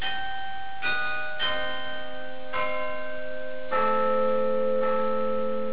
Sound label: carillons, bell